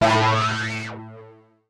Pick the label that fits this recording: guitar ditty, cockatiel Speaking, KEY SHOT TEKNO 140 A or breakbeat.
KEY SHOT TEKNO 140 A